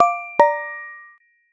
Welcome Chime, notifications; Samsung; Galaxy Watch 8

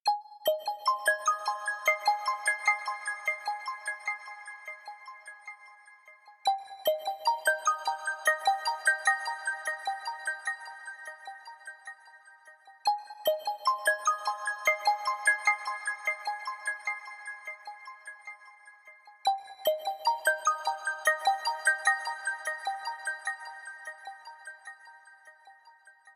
Ethereal, ringtones; Tecno Spark Go 2023

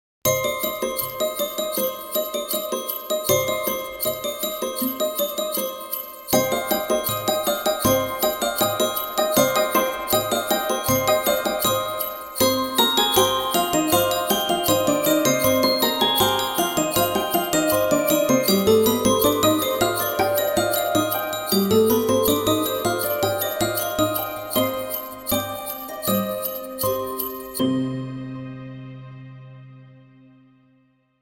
Magic Carol Alarm; HTC; Wintergreen

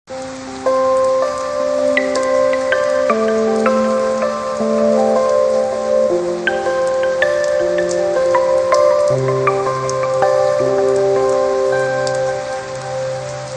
weather alarm rain; OnePlus; ui; OnePlus 11

